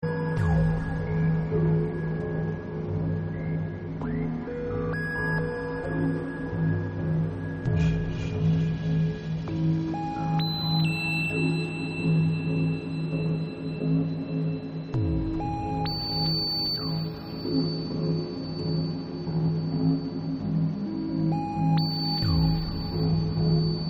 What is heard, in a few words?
frogs In Space